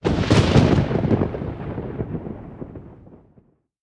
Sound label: thunder, ambient weather; minecraft